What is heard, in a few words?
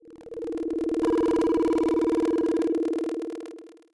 Laser Patrol